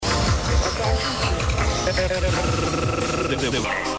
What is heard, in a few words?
jingle